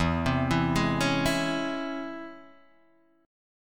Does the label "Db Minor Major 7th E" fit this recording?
Yes